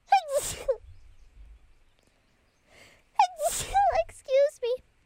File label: Sneeze